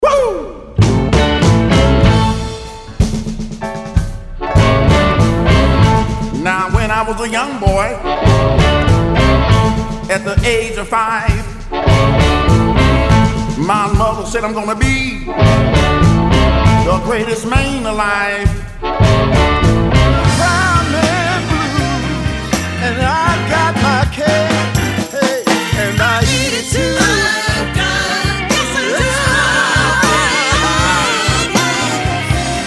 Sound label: Blues Medley